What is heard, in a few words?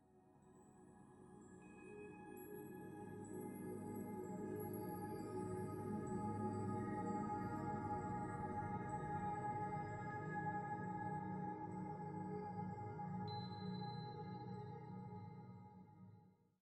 85bpm